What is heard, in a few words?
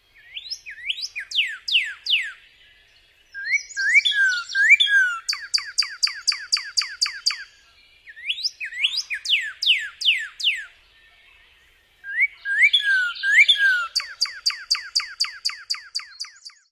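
Northern Cardinal, pair song duet female sings first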